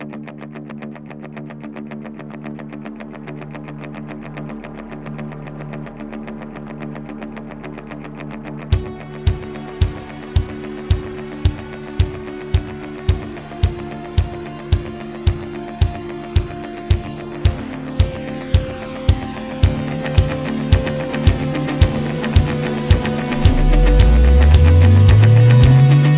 Zipline Intro 110bpm; battlefield hardline; ps3 ps4 windows xbox 360 xbox one

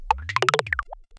snake transport

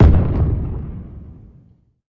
Fireworks Blast; Angry Birds Seasons

